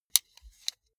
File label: sunglasses metal side piece fold